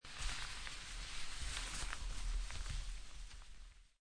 Playing Cards Shuffling By Sliding Cards Around On Table Short